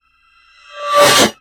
Swell Metallic; bottlerocket

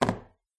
phone drops on wooden table; your free sounds

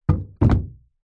wooden box crate set down hard surface; foley; zapsplat

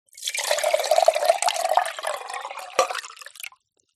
food drink still pour from jug into small glass; zapsplat

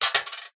small plastic object drop onto thin metal; foley; zapsplat

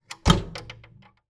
stove woodburner door close

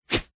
sport tennis racket single swing fast whoosh

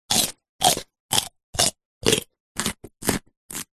human eat bite carrot raw munching; zapsplat

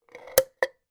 food tin can soup empty lid push inside; zapsplat

